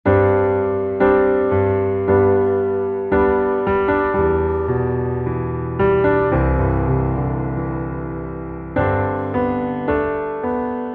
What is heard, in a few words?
Underscore PIANO; Worship Flow